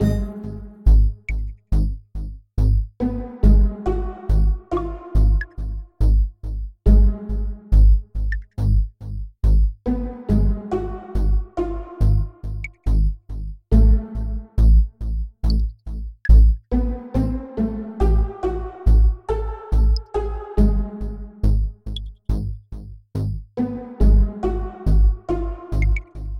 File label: Cave